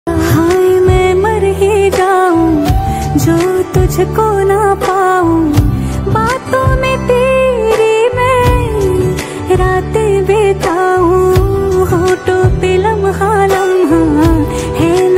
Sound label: Female Version